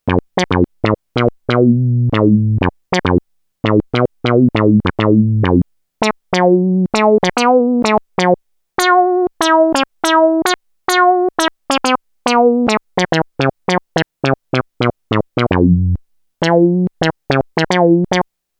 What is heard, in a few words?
FUNKY, Roland SH-101; Factory Patches